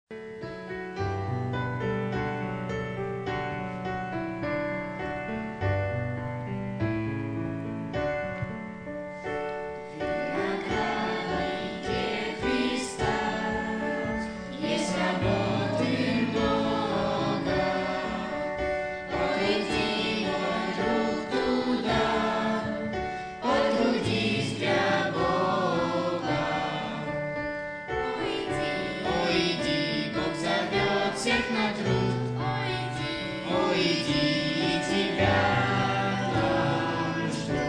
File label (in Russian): Детский хор